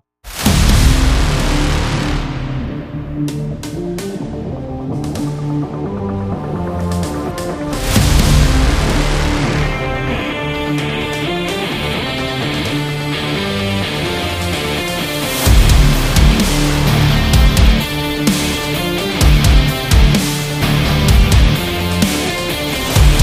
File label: Trailer Rock